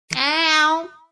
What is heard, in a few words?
OOOWWW